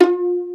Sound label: pizzi total, stringed; East West; Global Sounds